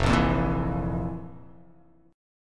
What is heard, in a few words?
F Major 7th Suspended 4th F; FM7sus4 strummed; JGuitar